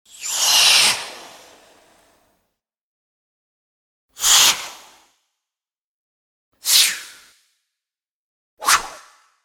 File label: Whooshes, 4 x Multimedia whooshes